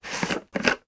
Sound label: household tub plastic lid off; sound effects one